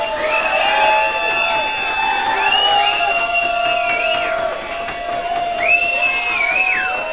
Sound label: Cheering win; How Would You Survive (1995)(Grolier)